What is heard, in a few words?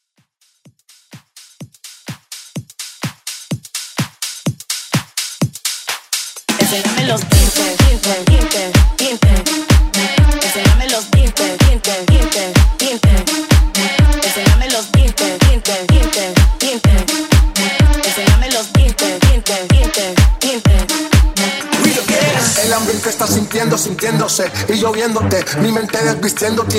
House